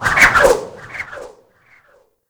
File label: Whip Spin, Weapons